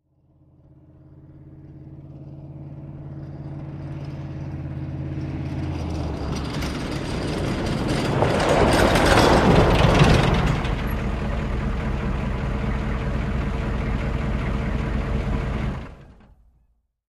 Humvee: Fast In, Idle And Off.

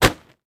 Bag 50 Pounds Throw G, bag drop

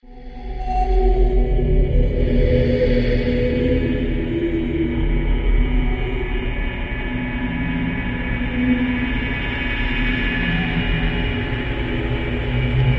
Suspended Hollow Echoi, metallic

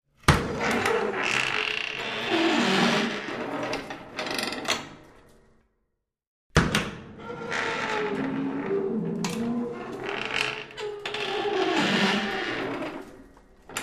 Trap-Door, Heavy Open; hinges & creaks

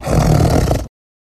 Lion Growl Inhale; big cats

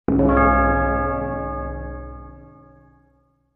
Music Effect Low Bel, chimes

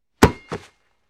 Football Heavy Hit B, football

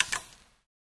Robothead Mechanism Small Hits x2; gears

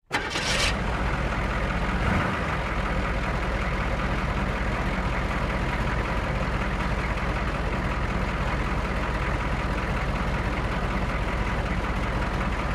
Humvee: Slow Start, Idle And Away.

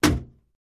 Metal Puncture Thud, small impacts

